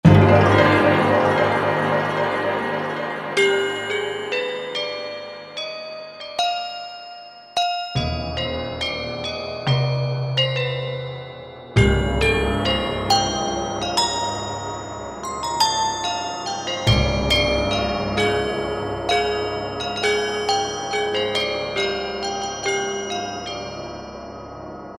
TheGamelan; Factory Combi demos

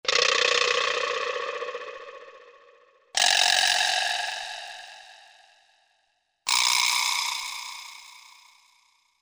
Vibraslap, Korg MSC-8S Percussion

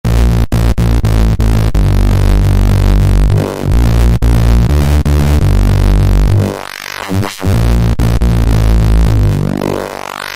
Techstep Ring Bass, Korg microKORG; Factory Voices

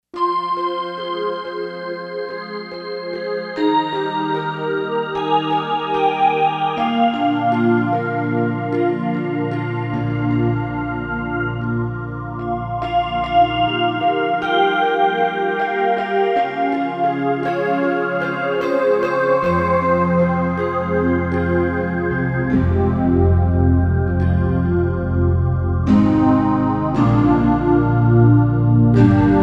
Air Bell Pad; Roland SC-880; Factory Patches

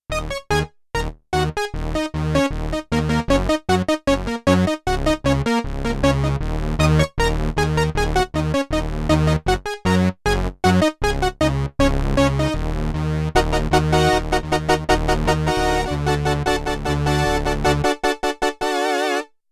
Octave Brass, Prophet 5; Yamaha TX16W

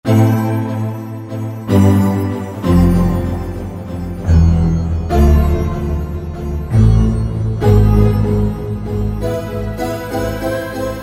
Roland V-Synth Staccato; Factory Patches

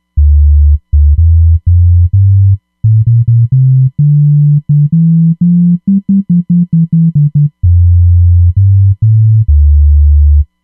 R&B Bass; Roland Fantom XR

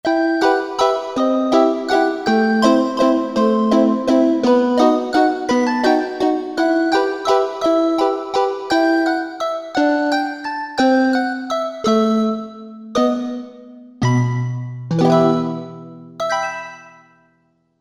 2 voices; Korg MSC-12S Synth 3

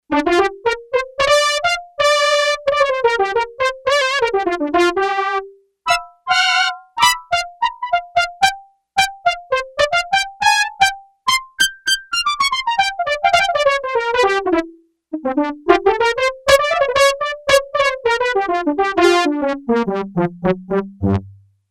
Brass Solo, Yamaha TX802; Performances; Factory Patches

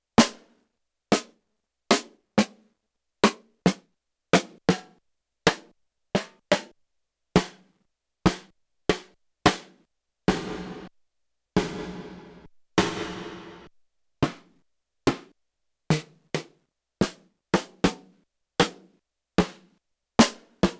snare, tom, Snare Drum Collection; Yamaha TX16W; 2-RCSNARE,SIM TOM

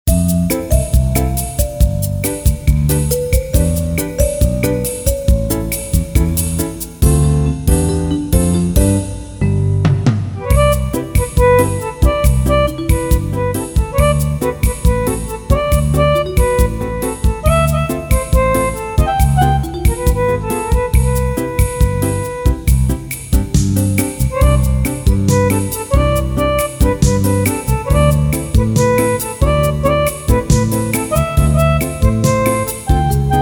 Bossa Nova, Casio CTK-601 Rhythms

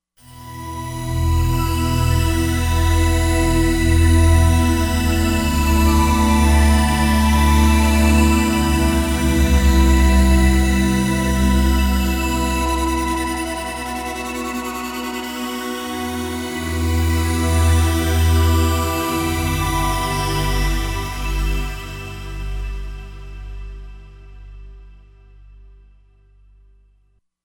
Mash Pad, Roland Fantom XR

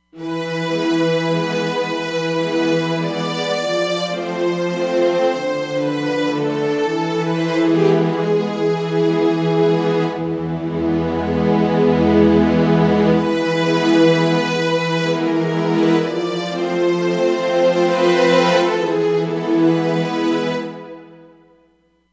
Strings Flow, Roland Fantom XR

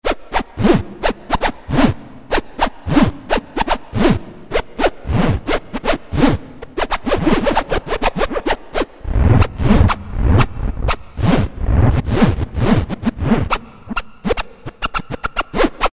Scratchin, Roland MKS-50; Factory examples

